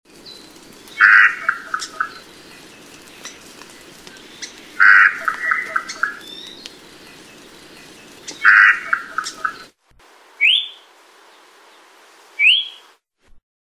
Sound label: Akohekohe